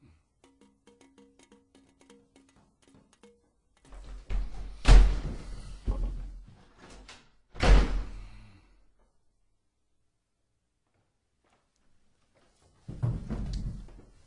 scary stuff

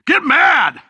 combustible, lemongrenade

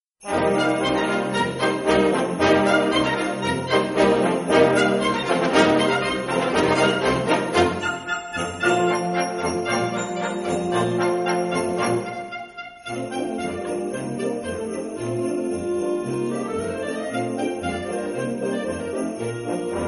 sleighride; xmas

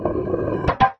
manhole close

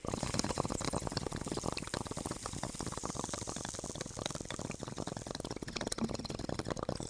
bong loop

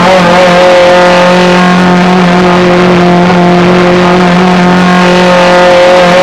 engines mid on in; acf engines

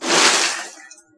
aluminium shake

